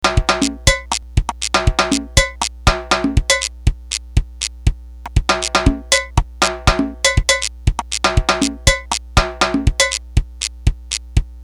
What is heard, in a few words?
depressing rock music